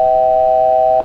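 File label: altitude Alert, 737-800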